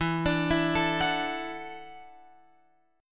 E 7th Suspended 2nd Suspended 4th Guitar Open F; arpeggiated; x,11,14,11,12,13

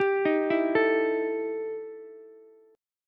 E Minor Major 7th Double Flat 5th E Ukulele; arpeggiated; JGuitar

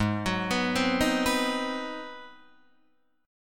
G sharp 7th Sharp 9th, Guitar, Open D

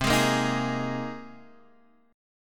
C Minor 7th Sharp 5th, Guitar, DADGAD; down; chord shape

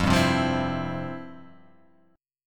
F Major 7th Suspended 2nd F; strummed; JGuitar

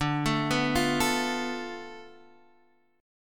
D Minor 6th Guitar Open D

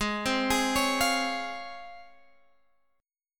C sharp Major 7th

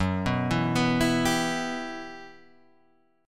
E Minor F sharp, chord; Em/F# Chord {2 2 2 4 5 3} arpeggiated

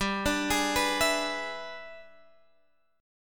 E 7th G, guitar; E7/G arpeggiated; JGuitar

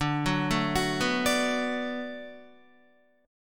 D 6th D down Guitar, chord; arpeggiated; JGuitar

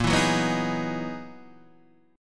F sharp 13th A sharp

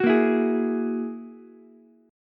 G sharp 7th Suspended 2nd Sharp 5th, Ukulele; G#7sus2#5; strummed; 11,10,12,11; JGuitar

